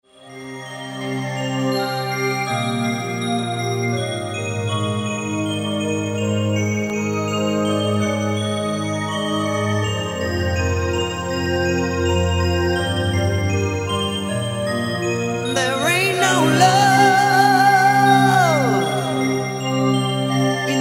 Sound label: roland d-50